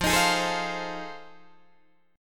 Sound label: F 7th Sharp 9th, Guitar, Open D